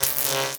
Aoe Shockwave Accent; Powers

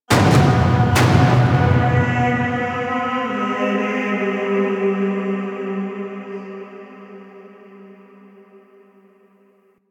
Entrance Drums; Cinematics; Warframe; The War Within; Queens Lair

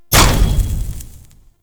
Scrap Container Open; Warframe; Missions; Zariman; Zariman Assassinate

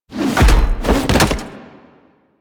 Vent Finisher Whoosh Landing Jump; Warframe; Venus; Orb Heist

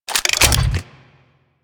Arch Rocket Crossbow Un Equip, Weapons; Warframe; Archwing

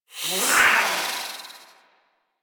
Feral Vocal Attack Medium; Warframe; Catbrow

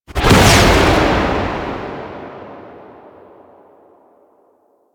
Swing Burst, Weapons; Warframe; Furax

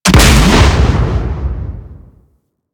Bomb Explosion; Missions; Raid; Grn Bomb Objective

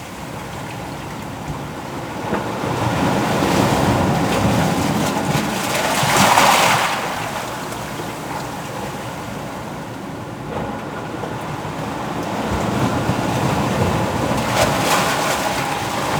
Cave Dock Waves

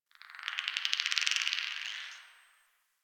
Magnet Chatter, Ambience

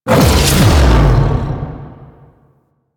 Howl Cast; Warframe; Voruna

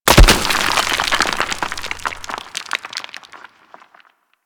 Golem Scythe Hit Debris; Warframe; Attacks

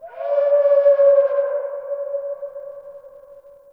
Night Creature Distant; Ambience; Night One Offs

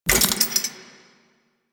Archwing Scythe mech; Melee; Warframe; Weapons; Exalted Archwing Scythe

